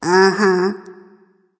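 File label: nonverbal agree; smite; ratatoskr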